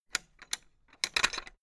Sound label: fence gate latch rattle hard; objekte; tueren